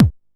HOUSE BD

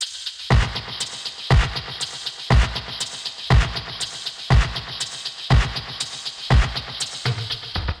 120 Beats, Drum Loops; Blissed Beats; Touch Loops; BlissedOutBeats

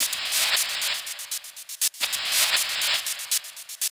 Atmos FX, Atmosphere & FX; Touch Loops; BlissedOutBeats

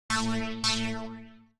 TEKNO 140, Techno; Techno2; Sphere

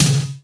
snare drum gated; SNAREDRUMS

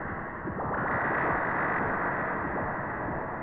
techno pad 140; Techno2; Keys

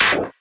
perc; Anthemic.Electro; drum hits

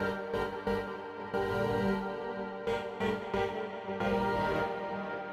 SYNTH PAD, INDUSTRIAL; Sphere

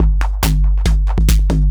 DRUMLOOP TEKNO 140; Techno2

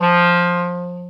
Alto Clarinet Portato F2; VarazdinOrchestral

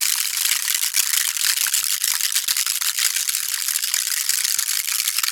ETHNO PERCUSSION 90; ethno2

